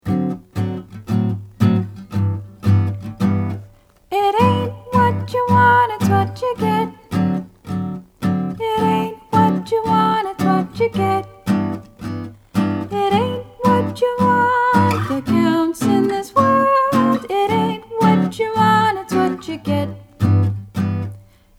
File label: chorus high harmony